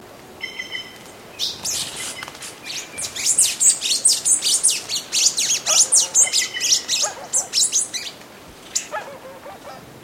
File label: coryphospingus pileatus